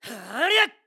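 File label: Sword Spin, voice; Link's sword-spin attack; BOTW; Breath of the Wild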